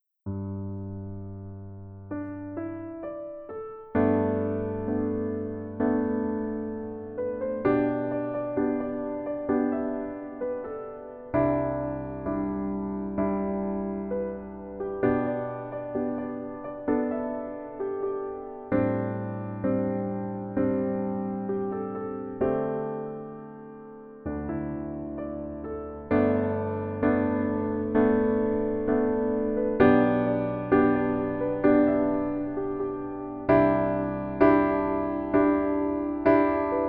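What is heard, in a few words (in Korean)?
Piano version 멜로디MR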